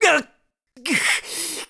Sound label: High Fall Land; landing from a high fall; Link; Skyward Sword